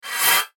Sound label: Rollover Warble Whoosh; Mouseovers; Multimedia; Blastwave